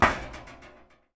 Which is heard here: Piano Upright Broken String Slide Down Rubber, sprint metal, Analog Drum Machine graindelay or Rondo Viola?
sprint metal